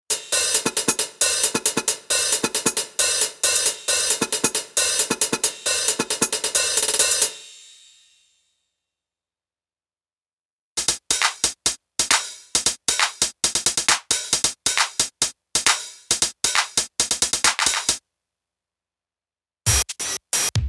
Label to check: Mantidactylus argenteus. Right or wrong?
Wrong